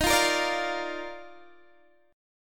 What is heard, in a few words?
Eb Major 7th Suspended 2nd, Guitar DADGAD; x,18,15,15,17,15; down